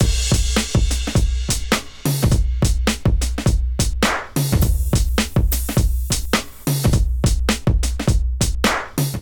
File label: Groove 104 BPM, Drums; MIDI Clips; Move Up; Ableton; Factory Packs; Chop and Swing